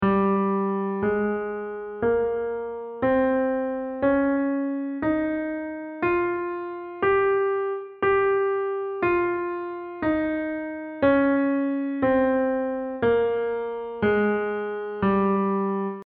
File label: Locrian; ear-training